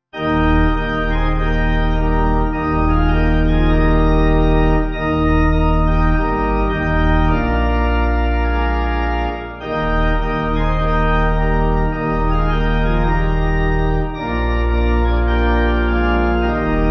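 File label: Pipe, hymn backing; If You From Sin; Black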